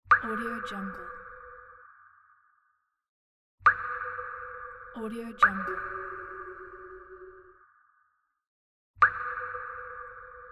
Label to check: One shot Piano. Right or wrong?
Wrong